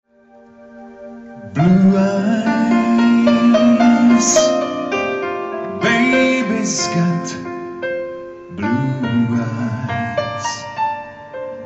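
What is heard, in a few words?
Male Slovak sing mix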